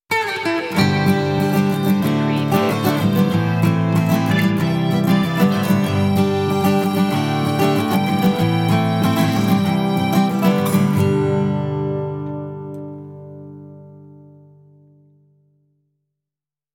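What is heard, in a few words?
Loop Indy Rock Stinger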